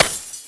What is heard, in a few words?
blizzard; allods2d